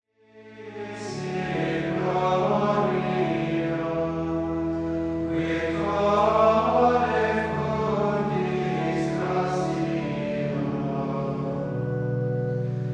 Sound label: Sunday Compline Hymn, Chant; Chant Compendium; catholic